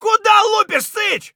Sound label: ffire; Escape from Tarkov